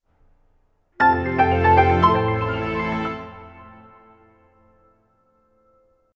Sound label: sound logo